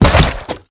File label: Drone Vulcan Attack Start; blue archive